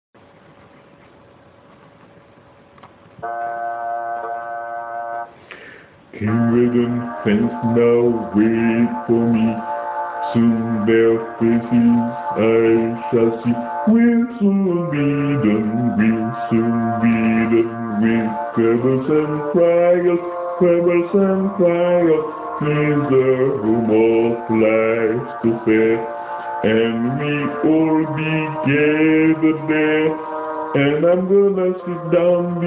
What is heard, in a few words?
bass